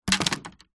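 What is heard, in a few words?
leisure fishing rod set down wood jetty; zapsplat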